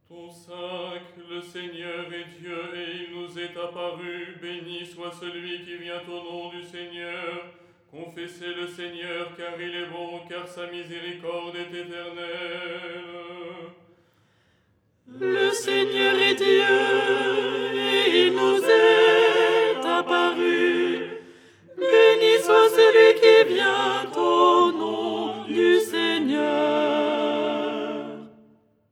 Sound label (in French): alto; " Le Seigneur est Dieu… "; Les 8 tons en français